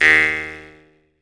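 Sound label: Jaw Harps, Pitched Percussion; E-MU Producer Series Vol. 7 – Old World Instruments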